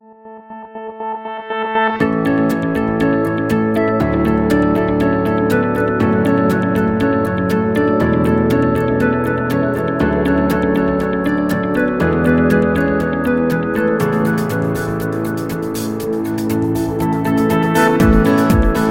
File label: Bright and Positive, corporate music; corporate music vol 5